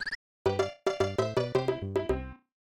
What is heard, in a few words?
Player Down; SMAS; SMB